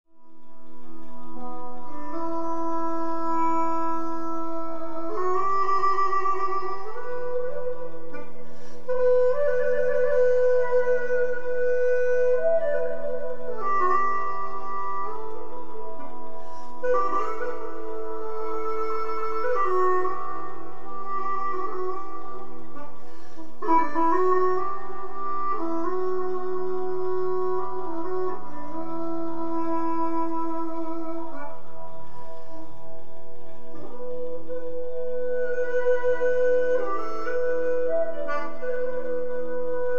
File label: Hulusi